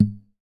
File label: Classical Guitar Extended Technique Plink